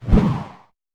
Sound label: woosh; android